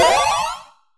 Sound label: Retro PowerUP Stereo UP